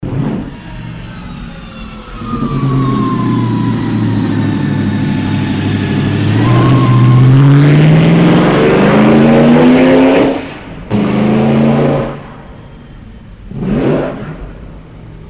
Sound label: engine sound